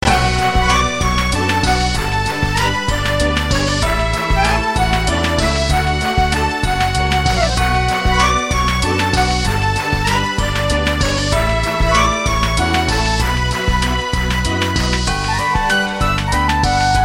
tune; Horizon

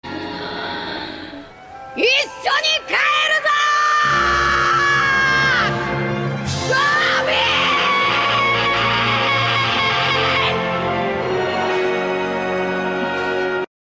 Screams; Luffy

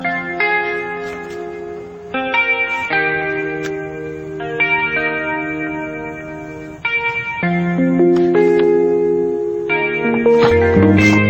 Luv Beats; Dairy Milk

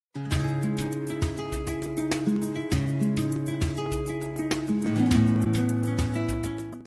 Soothing guitar; kolher guitar

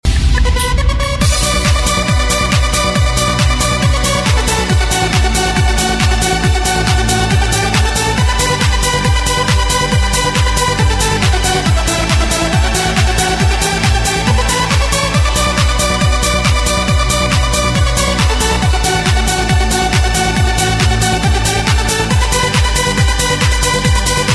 Elektro Trance

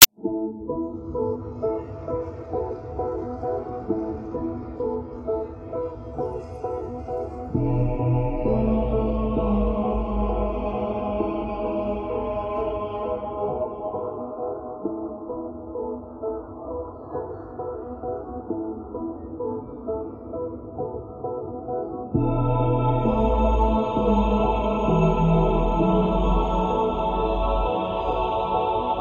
Soft Strums

